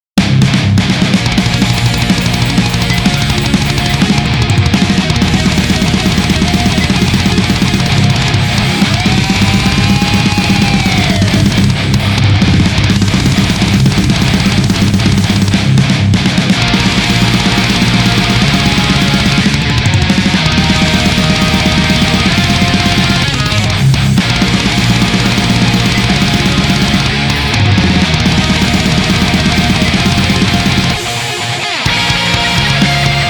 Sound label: Instrumental; Metal